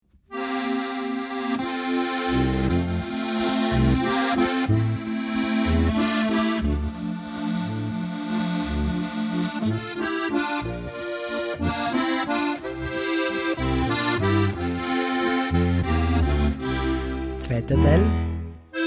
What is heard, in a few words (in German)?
Sommernachtswalzer; Steirische Harmonika